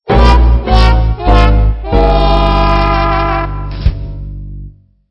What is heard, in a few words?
Stinger; STINGERS